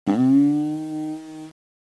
funny, farts

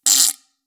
cancelled fart

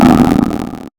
synthetic fart

